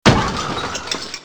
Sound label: se car; maoudamashii